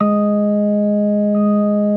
B3 G#4; Best Service; Hyper Dance

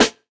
Snare, jungle breaks drum sounds

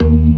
ORGAN, C3 PERC; Keith Emerson Signature Series

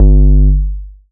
ragga, bass analog & synth; USB Soundscan vol.12; AKAI; PROFESSOR

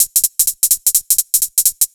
127 DRM, Fresh Disco House

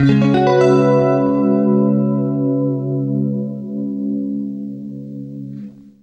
CLEAN C#M9 L, Guitar; East West; Steve Stevens Guitar Samples Collection

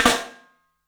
MARCHIN ROL; 3D Sound; 3D Drums

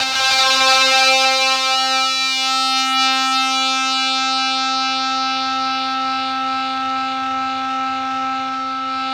ZWEEP; Spectrasonic Distorted Reality 1

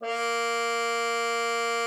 CUP MUTE, A#3; Xsample VOL-3

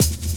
Dance Mega Drums, KIT D+B; Best Service

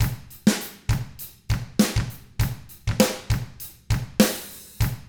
ROCK, Drums; ILIO; Double Platinum Drums 1

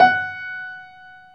PIA GRS, PIA_GRAND, Roland; Sound & Vision; Gigapack I CD 2; PIA Grand 4

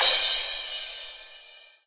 Crash, Cymbals

